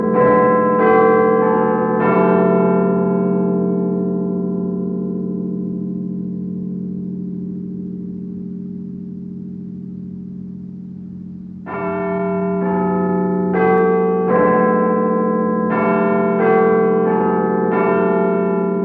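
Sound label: westminster chimes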